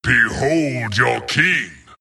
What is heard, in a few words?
attack; Skeleton King; wraith